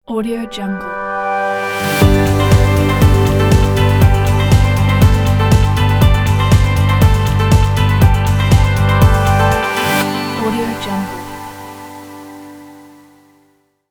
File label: success logo